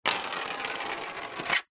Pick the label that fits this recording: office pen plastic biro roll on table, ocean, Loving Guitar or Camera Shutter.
office pen plastic biro roll on table